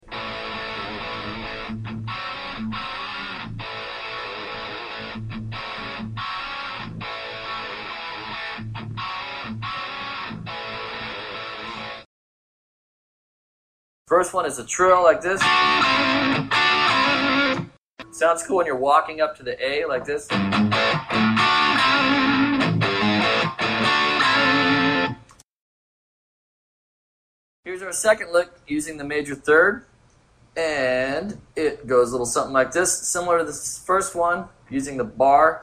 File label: major 3rd lead guitar